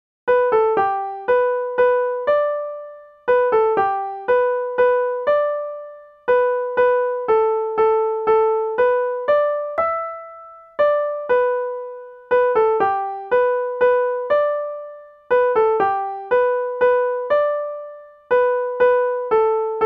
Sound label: Waltz